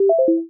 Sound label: shake; Apple iPhone-3GS